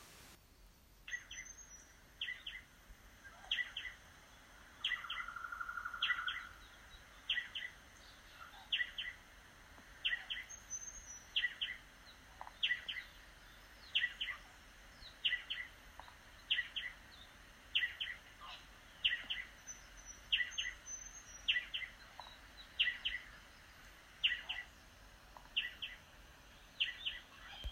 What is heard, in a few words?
40spot Breeding Call; Tinderbox